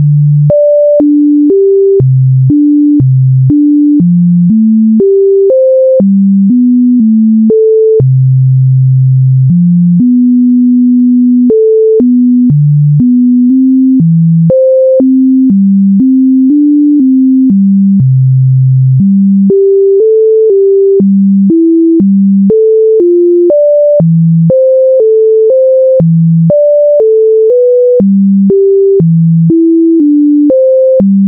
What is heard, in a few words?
music; openctf2019